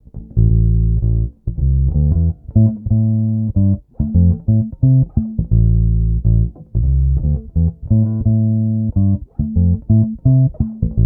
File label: Neck bass midboost